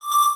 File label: Multimedia Internet CD-Rom Flash Pop Up Short